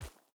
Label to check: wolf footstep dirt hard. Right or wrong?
Right